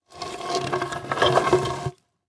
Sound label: Impact Wood Drag Through Dirt And Rock; Impacts